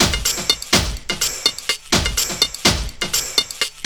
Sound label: TRIP 90, trip loop